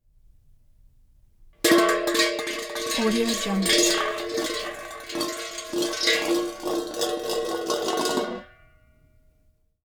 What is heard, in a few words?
1964 cadillac